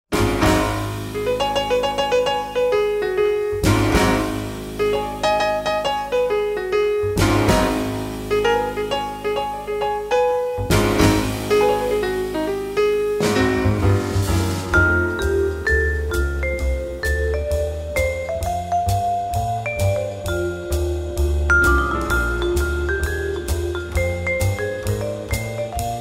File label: Quartet, Groove